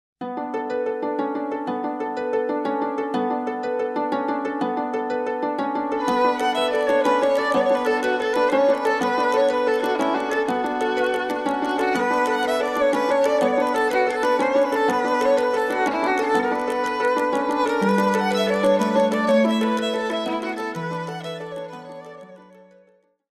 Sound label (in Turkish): SANTRAL MÜZİK